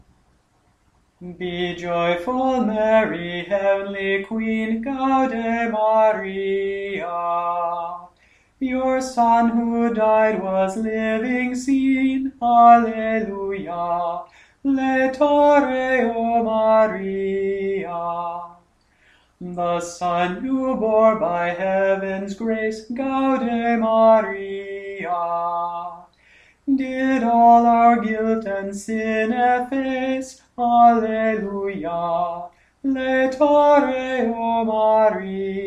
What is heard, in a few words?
Regina Coeli Jubila English, chant